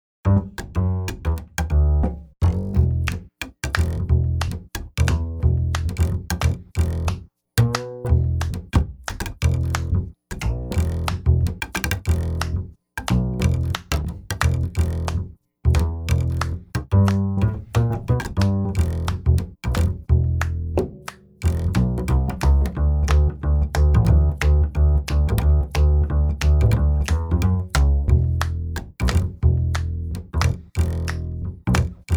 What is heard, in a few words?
boogie roots bass